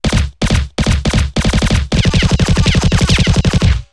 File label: Machine Gun Bursts; Weapons & Explosions; Sony Pictures Sound Effects Series